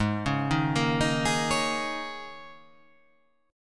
G sharp Suspended 2nd Suspended 4th, G sharp, down